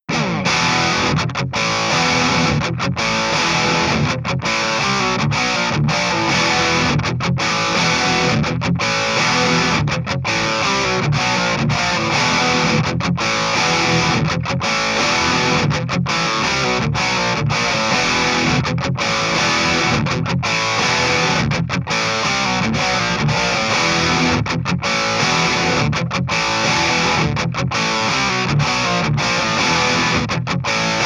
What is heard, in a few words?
JCM + Mesa EQ IV C+, Real Amps